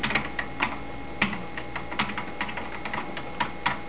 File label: keyboard small